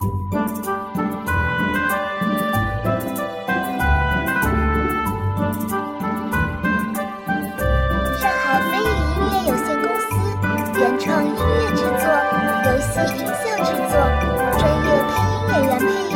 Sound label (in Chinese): Q版轻松休闲主界面; 成都海顿